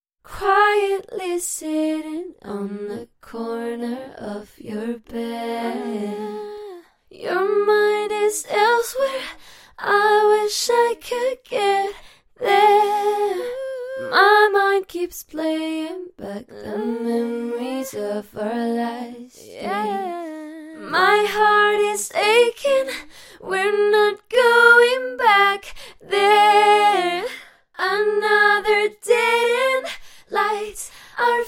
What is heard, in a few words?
pop female acapella, 142 AbM